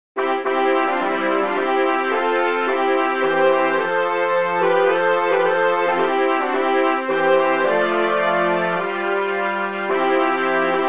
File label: Birthdays Trumpet Fanfar